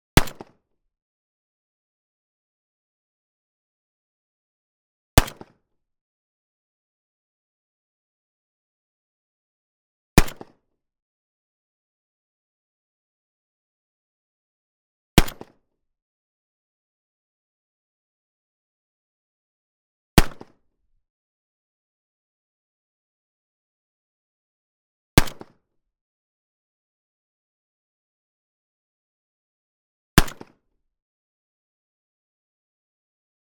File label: Saiga 12 Cal.12 Single Shots 1m Side; MKH8040; MONO; Dramatic Cat